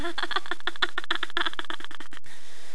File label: cackle